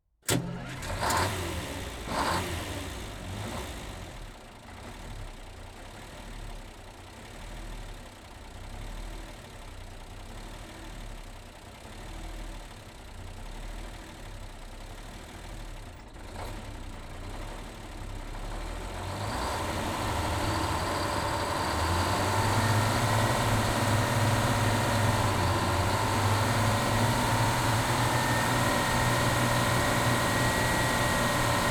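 Valentine Tank Onboard Start Slow Drive Stop Reverse Stop Engine; Mark IX DD 1940s; DPA4062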